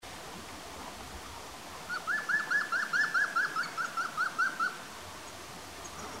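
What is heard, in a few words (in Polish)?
zielony, dzięcioł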